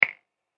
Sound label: Finger Click Snap; Human sound effect; Snapping Sound Effects